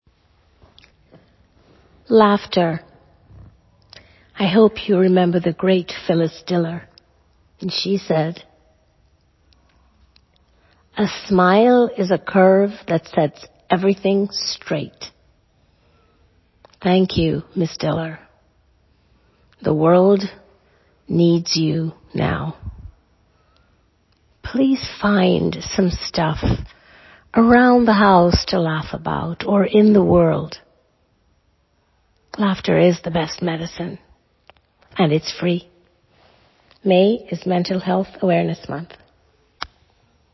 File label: Laugh